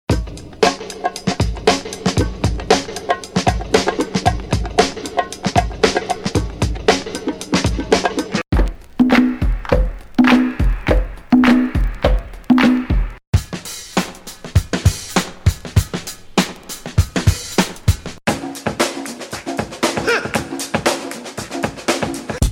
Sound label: PHAT OLD SCHOOL DRUM LOOPS